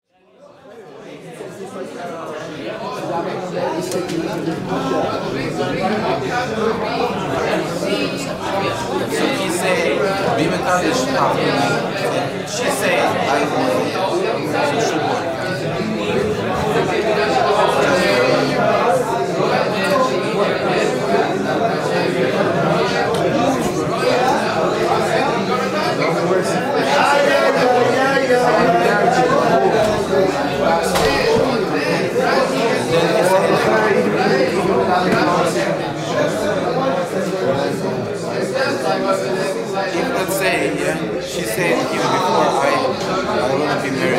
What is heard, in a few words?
beis medrash sound ambiance